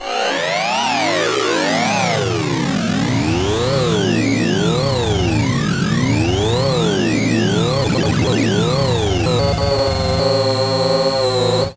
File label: Alien FX; Sonic Foundry (Sony Creative Software); Underground UK House & Electro